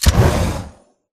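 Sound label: Tick Movement Traverse Jets; Titanfall 2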